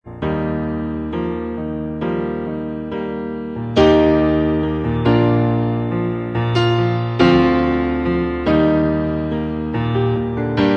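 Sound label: live instrumental track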